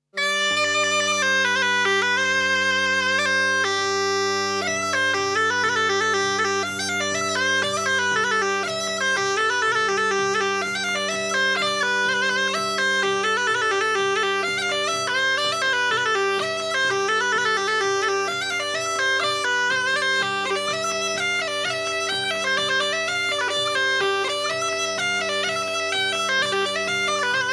Polka; Polka de gavinet

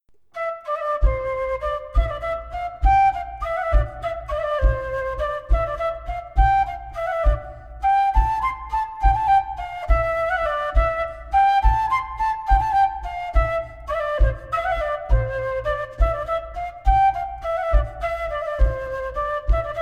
Bourree; Villebichot

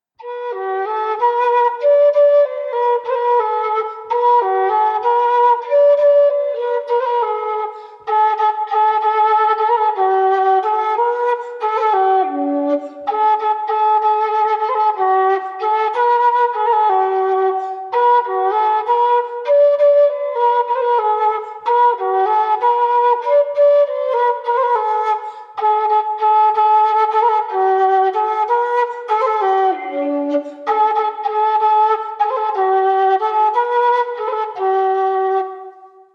Romance; Première nuit des noces; Romance de Barante

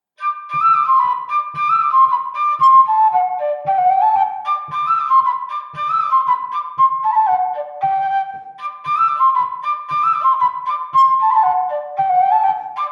Bourree; Recueil De Raoulx

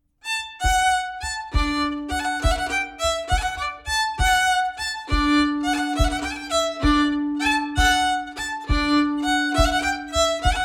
Bourree d Auvergne

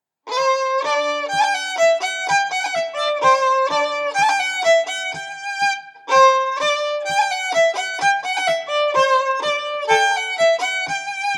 Bourree

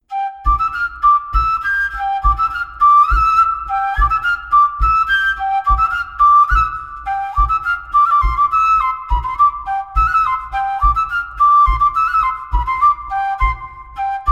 Montagnarde

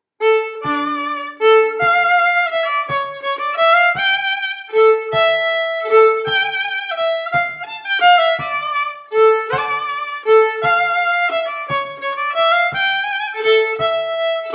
Valse; Les premieres valses 1ere livraison manuscrits regionaux

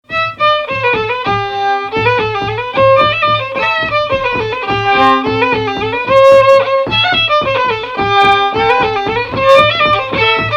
Bourrée Basses; Bourrée d'Augères

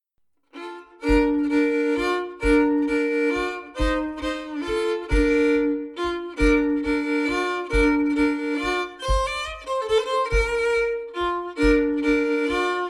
Valse

